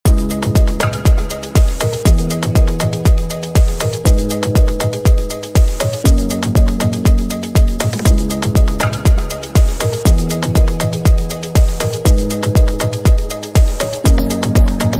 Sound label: afro house bollywood afro house